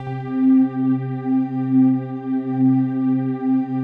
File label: PAD, DIGI-PADS